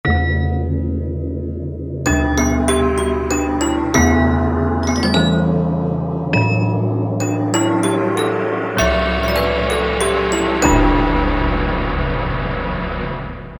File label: Bell Drum, Roland U-220; Factory Timbres